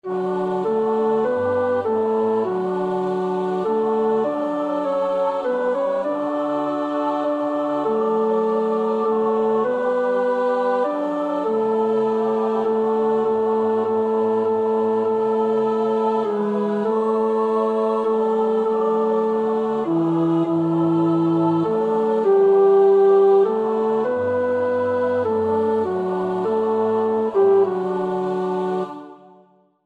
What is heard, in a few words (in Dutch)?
tenor; Nieuw Liedboek; NLB 534 (Hij die de blinden weer liet zien); Vesperkoor Bunschoten-Spakenburg